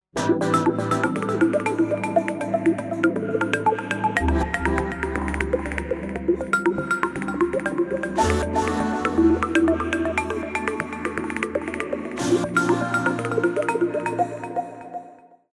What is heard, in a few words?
Montage